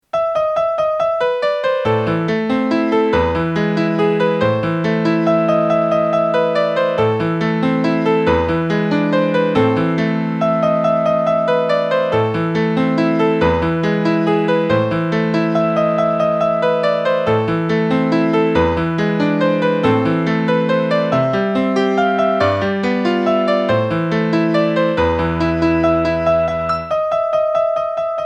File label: fur elise ringtone; beethoven